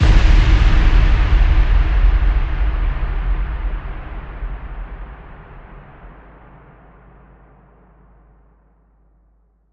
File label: Hit Amb Warrior Enclave